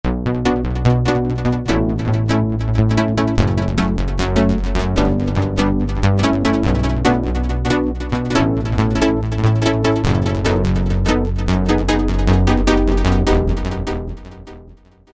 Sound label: Rubber Banned, Korg TR-Rack